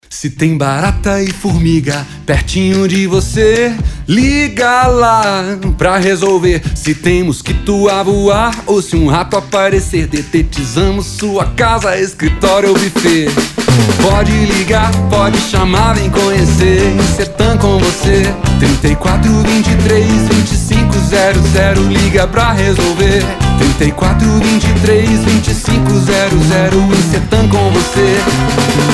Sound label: JINGLE; INSETAN